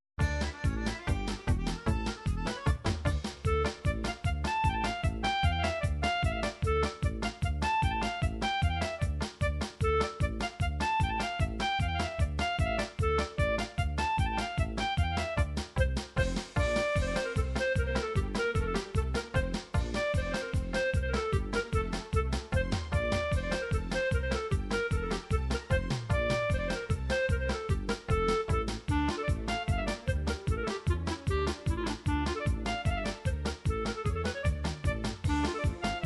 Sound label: russian ukrainian polka, midi